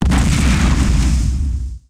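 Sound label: sentry gun explode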